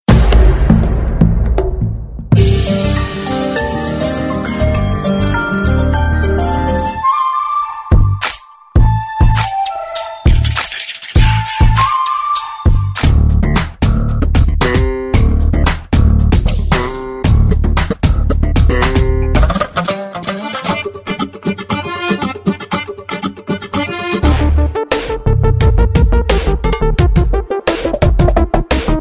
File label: Beats